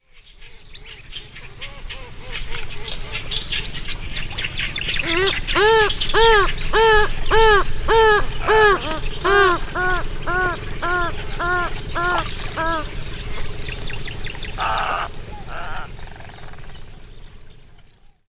Purple Swamphen

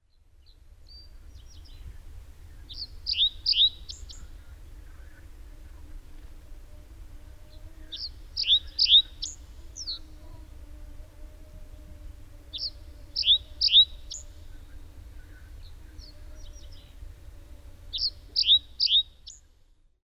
Reed Bunting

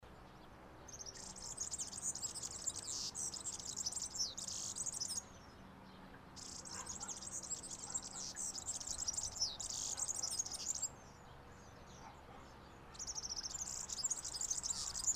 European Serin

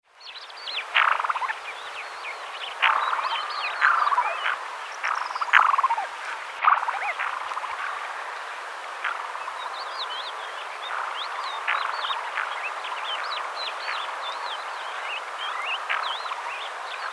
Black-bellied Sandgrouse

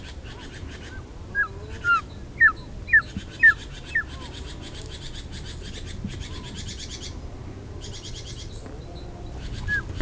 Short-toed Eagle

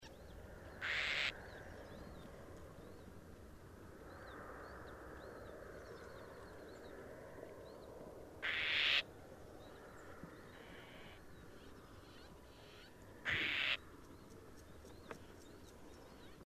Southern Grey Shrike

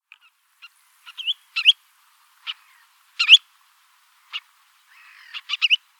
Spotted Redshank

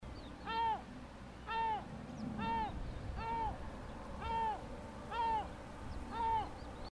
Yellow-legged Gull

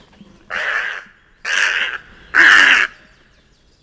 Little Egret

